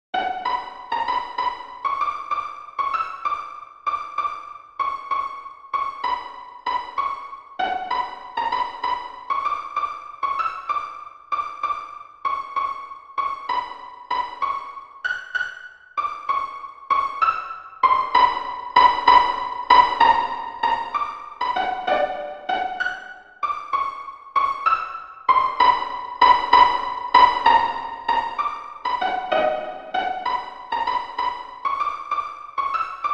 Romance Mandolin 100bpm

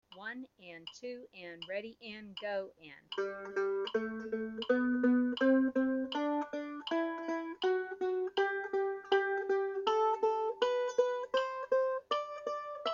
G Scale 8th Notes 80bpm, mandolin

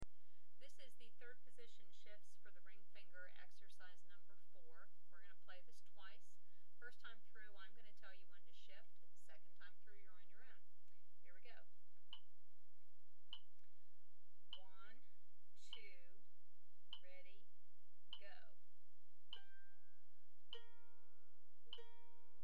Third Position Shifts Ring Finger, mandolin

